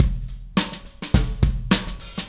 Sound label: Beat Fill, Indie Rock; Sonic Foundry (Sony Creative Software); Indie Rock Anthems